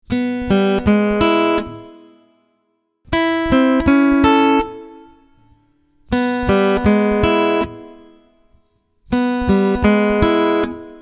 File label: easy blues melody line, acoustic guitar; easy acoustic blues pattern example